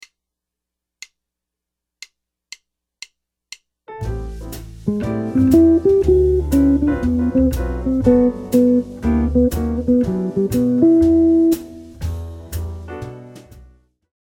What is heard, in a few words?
arpeggio approach notes, jazz guitar